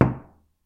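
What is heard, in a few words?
knock on wooden wall; knocking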